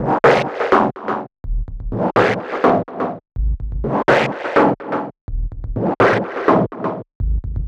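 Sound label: Synth Loop, 125, Cm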